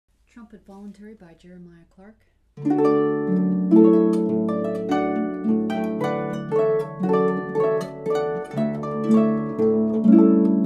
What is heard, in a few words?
Trumpet